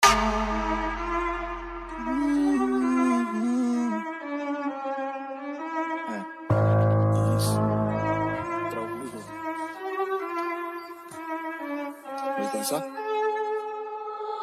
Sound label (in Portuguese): esp de cavalgada